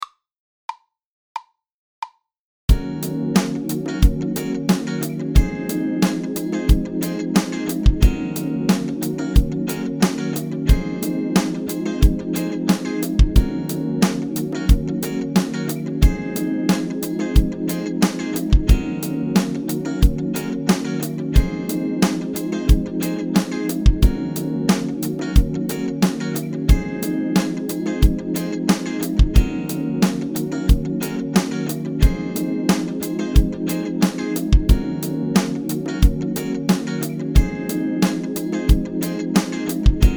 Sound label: fretless groove, bass playalongs